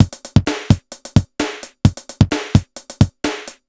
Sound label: Alesis HR-16B (130BPM), beats